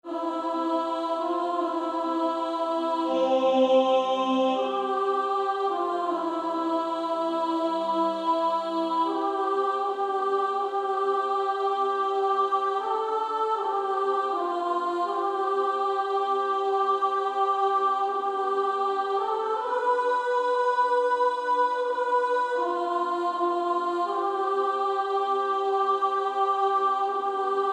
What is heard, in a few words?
Female Choir S2; Rock of Ages